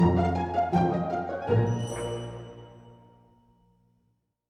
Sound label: Asteroids Arcade Machine Sting; lego the incredibles 2018 switch